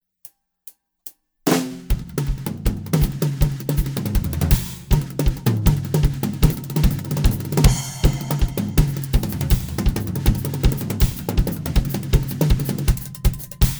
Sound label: Tribal Drums